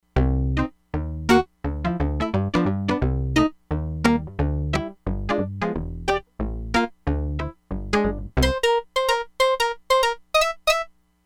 CHOP GUITAR, Crumar Bit 99; Factory Programs

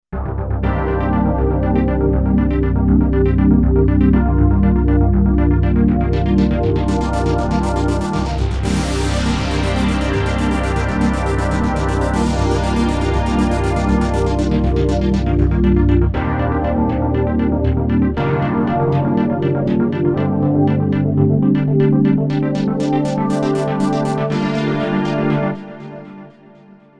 5th Sweeper

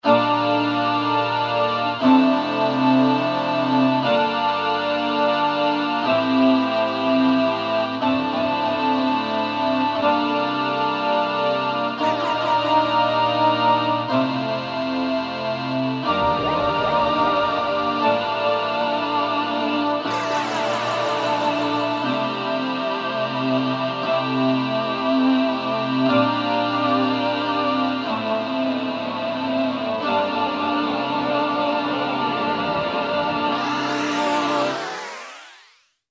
VOCODER, Korg microKORG; Factory Demo Songs

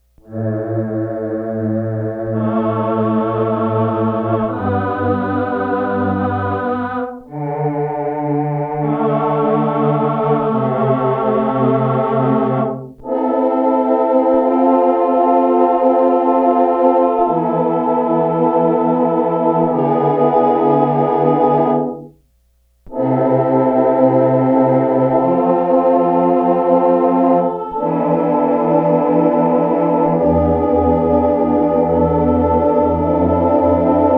MALE

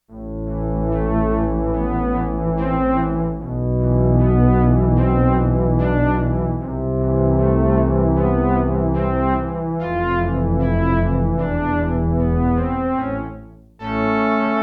Cello Sect, Roland JX-8P

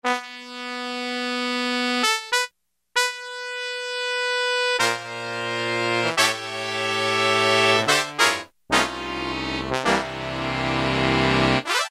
Trump-Sax, Roland U-110; Factory Patch

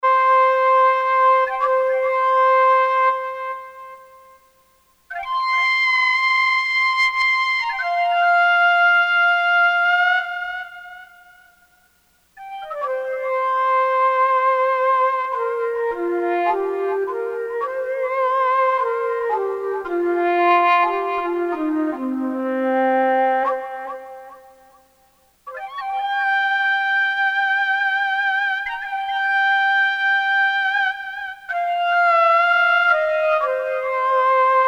EchoShak, Japanese Inst, Korg DSS-1; Japanese Inst 2

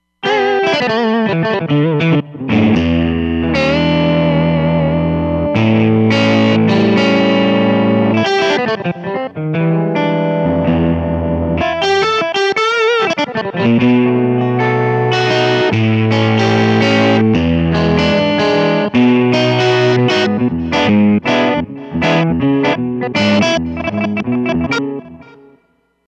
Blues Tubes, Roland Fantom XR

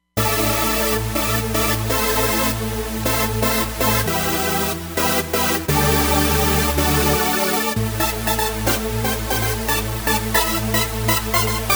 Trance

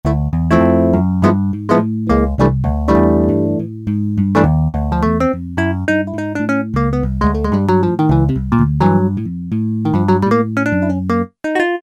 Jazz Guitar, Kawai K1m

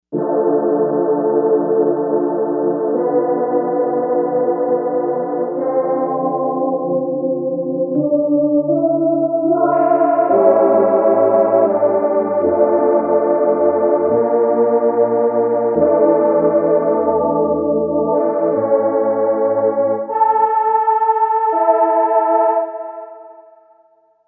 Tenor Choir; Novation UltraNova; Daniel Fisher Volume 2

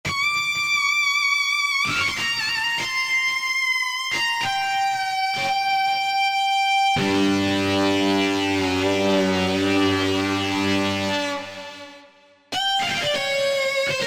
Dist Guitar; Korg TR-Rack; Yeah! DistGuitar

